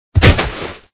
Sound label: Ground; Dad 'n Me